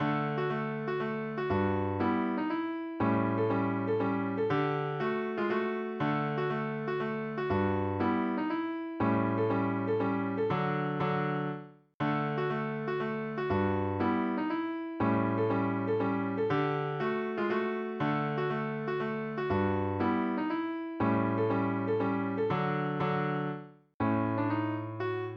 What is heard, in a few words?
guitar